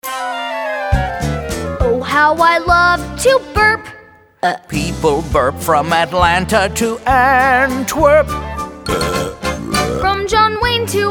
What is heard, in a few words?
Burping Song